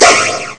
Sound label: Hua; ST-XX Amiga Soundtracker Sample Packs